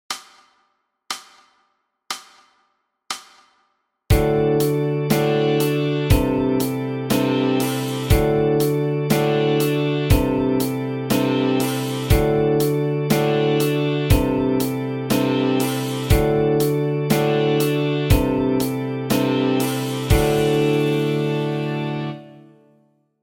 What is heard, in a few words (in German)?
Rock Song G Saite